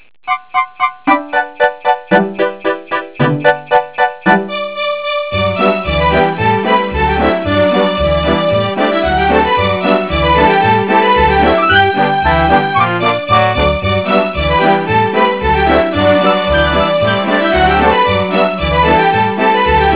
sleigh, Kiddie Ride

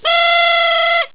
PARTYHOR

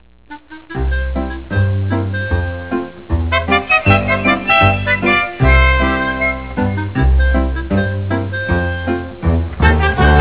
STING

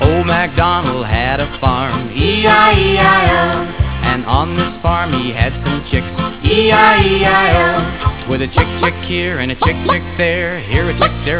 old mac, Kiddie Ride

